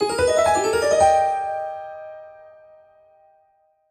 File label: Mystery Arp; soundscrate